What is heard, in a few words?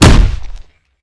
Punch Hit